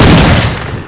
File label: autocannon; gusanos promode